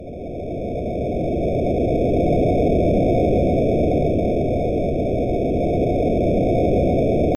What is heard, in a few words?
Pipes; imscared